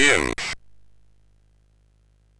sid MOS6581R2; Cave of the Word Wizard Set 3

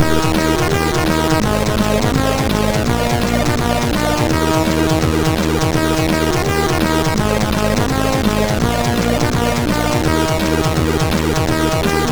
sid MOS6581R3; My Little Cock

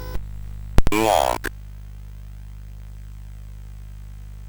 sid CSG8580R5

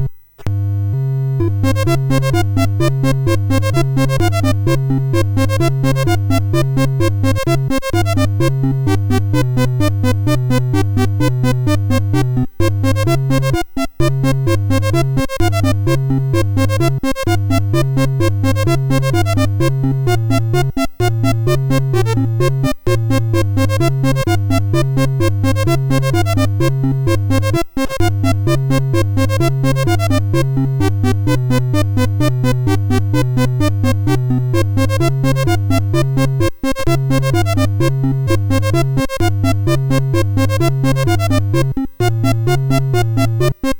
sid, MOS6581R4

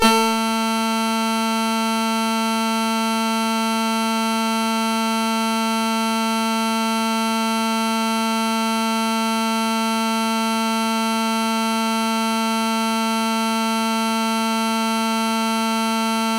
sid CSG8580R5; Bird Mother

